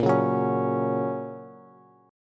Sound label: Bb Minor Sharp 5th, Bass; strummed; chord shape; 18,16,16,18